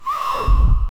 violent distorted scream

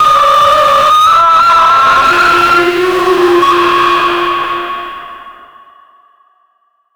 distorted

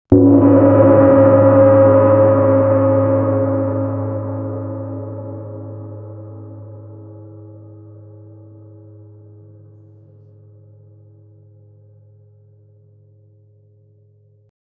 a strong blow to the, musical instruments

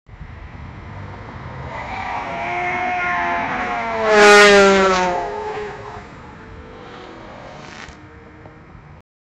sports cars sound effects

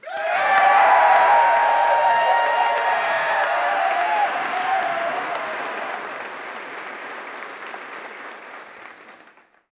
applause crowd cheers; cheering

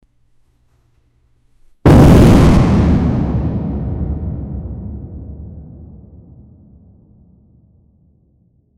echo burp; playful

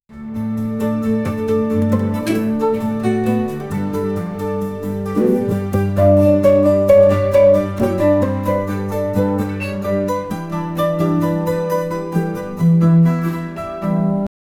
background acoustic music

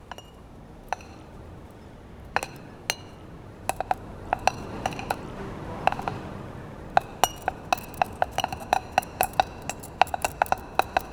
player walking on stone; natural

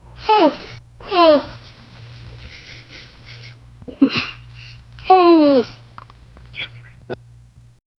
dim bedroom repeated dist; emotional

